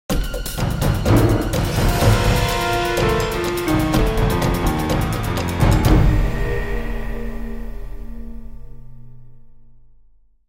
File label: Fight Lose; The Da Vinci Code; XBOX